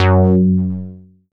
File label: FROG BASS; Masterbits; Dance Trax